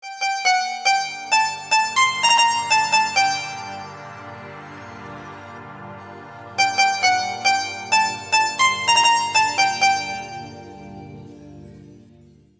malayalam ringtone

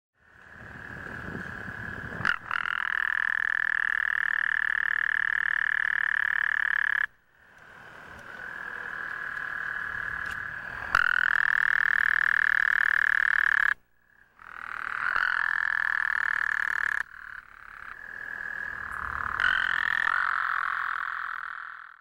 frog ringtone

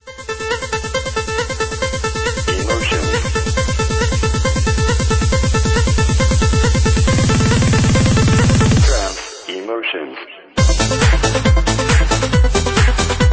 trans emotions ringtone

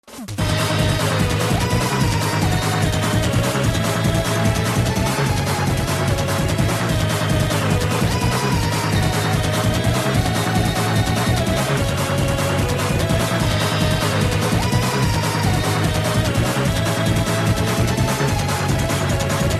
roaring knight ringtone

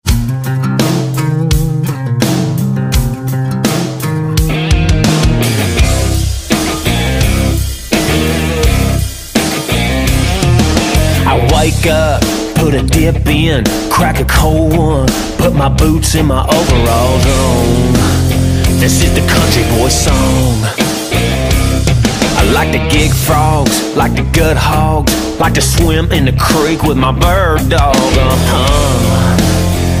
the country boy

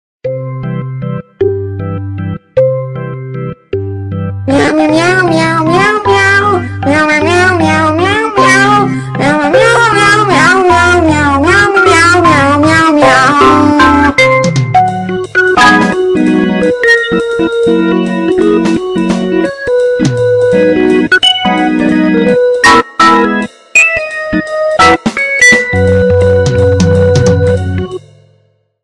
happy birthday ringtone

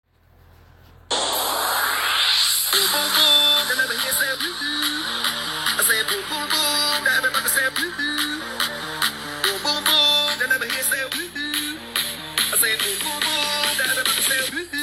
whoohoo ringtone

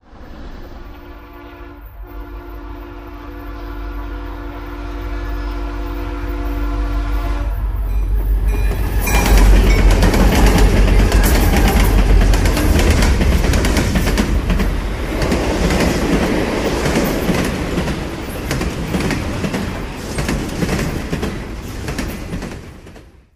train sound ringtone; android

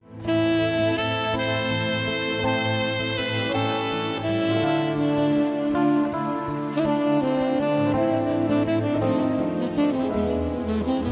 saxophon ringtone; android

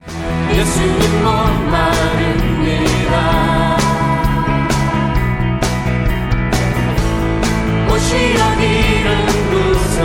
gospel ringtone

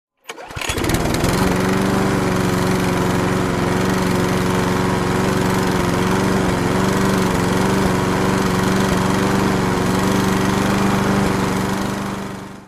motor ringtone; android

